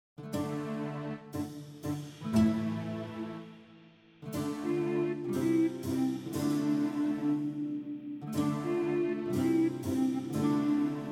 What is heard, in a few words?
shadows in the night instrumental; gunpowder plot